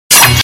wind; battle

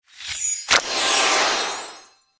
flop

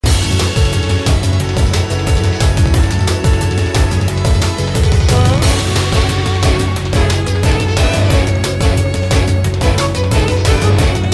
battle

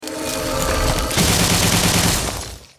attack; hero

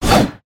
sword

